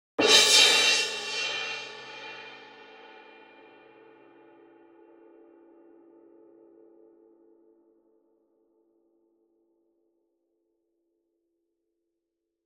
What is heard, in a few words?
CYMBAL Crash Hit Soft Beater Near; boom library; Cinematic Strikes Construction Kit